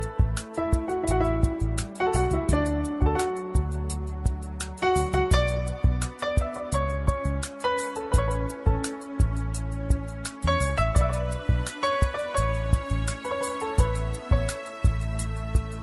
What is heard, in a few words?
music bollywood; bollywood ringtones